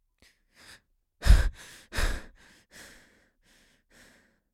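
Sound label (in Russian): человек задыхается запыхался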